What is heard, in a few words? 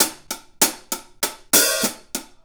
Hi Hat Pattern